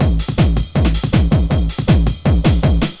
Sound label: LOOP, Eurotech; Sounds Good